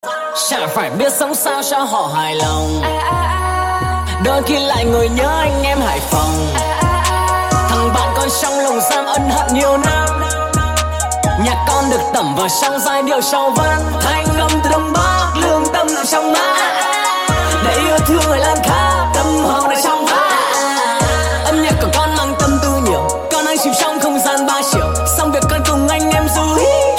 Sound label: rap trap